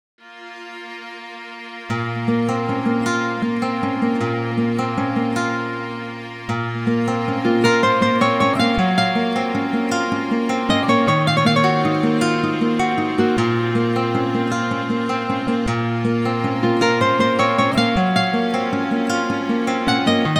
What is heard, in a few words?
guitar solo